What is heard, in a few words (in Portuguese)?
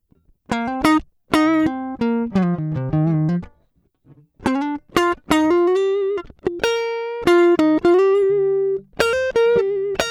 Guitarra Linha